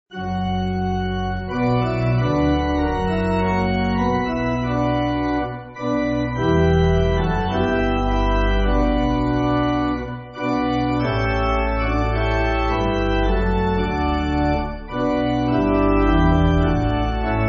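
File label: Pipe Organ, Backing Files for Churches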